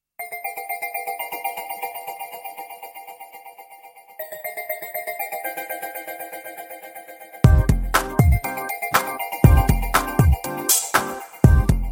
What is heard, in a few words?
Love Telugu Movie Instrumental Tones